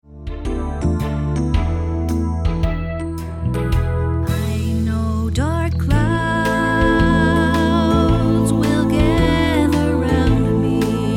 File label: Gospel Choir; Wayfaring Stranger